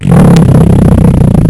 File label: raspbery